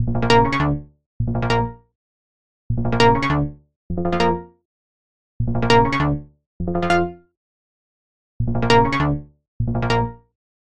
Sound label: ringtone